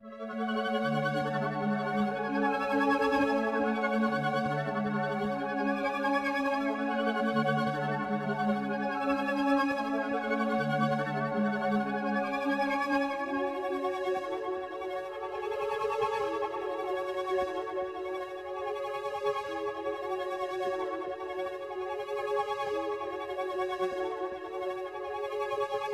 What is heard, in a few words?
148 BPM F# Min Pad, loop stems; Melody; Cymatics; 2022 Melody Collection - Wavy; Man On Fire